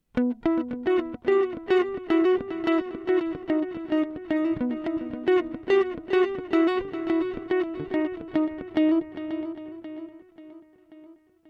stereo digital; NUX duotime

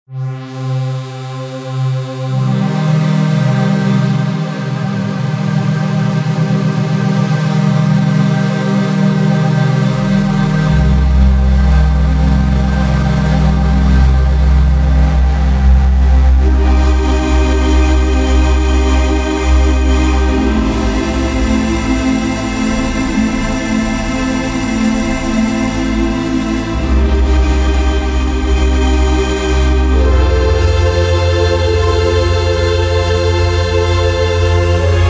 Waldorf Quantum

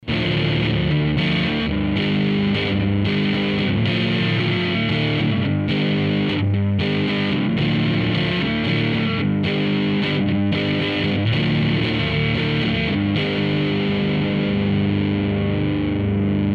Antilope Zen Tour Gtr Rock

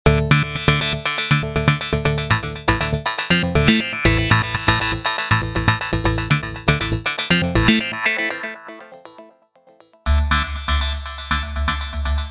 Omni2 fx

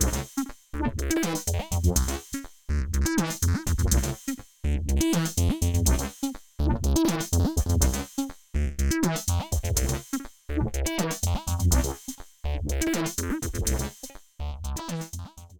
System 500 PWM LFOs Filter LFO X Sync FX

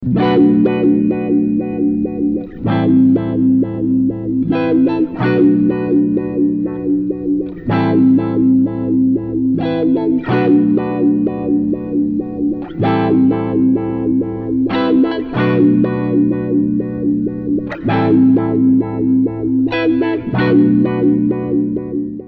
Vision Wah all button IN

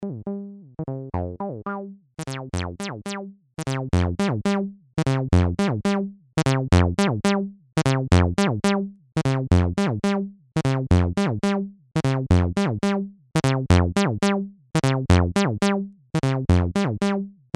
TD-3 Muffler

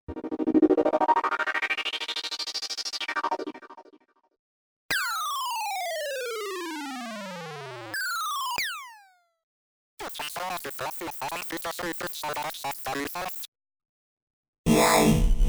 RK100S Diverse Sounds

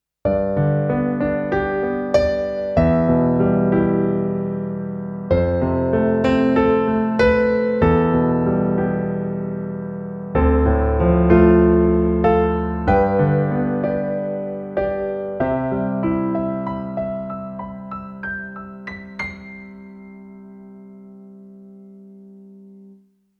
Piano; V3 Sound Sonority XXL

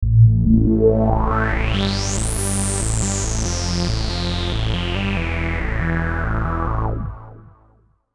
Fat Sweep

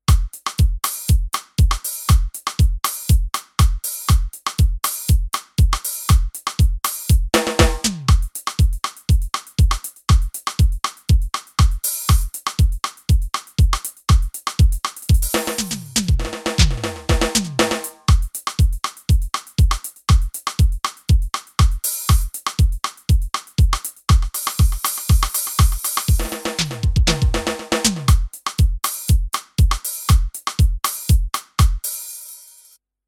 Groove

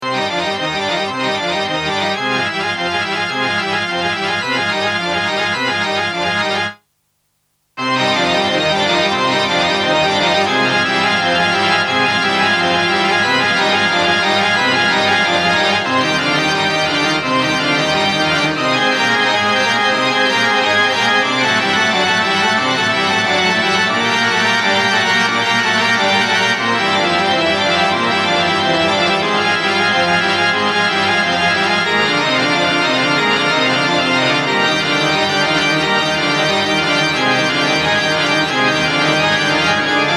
Alesis Midiverb2 Reverb Large Warm 2.3sec

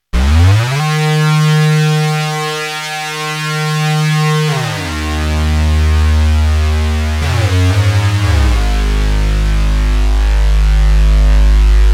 Chroma Poly Portamento

